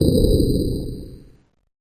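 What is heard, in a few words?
Deep Digital Button Press, stinger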